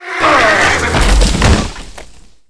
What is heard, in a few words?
Mob die; Monster; 혈적광풍대; 적흠